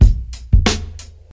92 bpm breakbeat b key, Free drum groove - kick tuned to the B note. Loudest frequency: 800Hz; drum loops